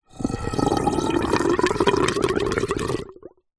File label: water pipe gurgle; nature